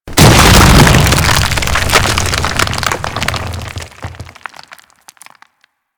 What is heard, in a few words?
Golem Melee Emerge; Warframe; Foley